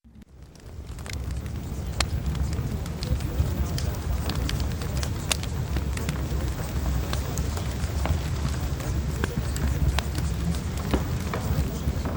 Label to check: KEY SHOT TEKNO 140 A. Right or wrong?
Wrong